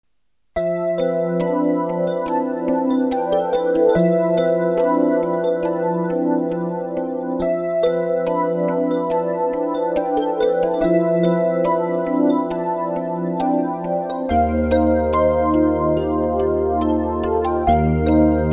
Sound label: yamaha ex